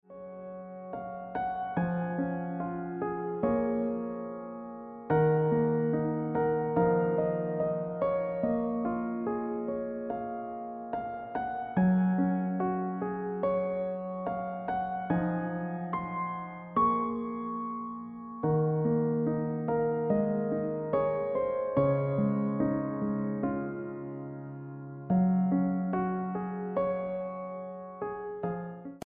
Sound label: Piano Music